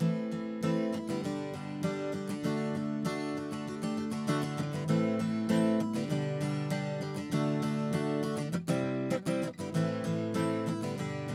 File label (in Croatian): SM57 tijelo; Reaper project